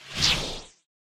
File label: lightsword turning off; soundscrate